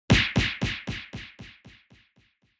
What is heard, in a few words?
Hit